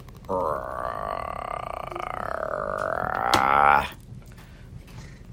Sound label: growl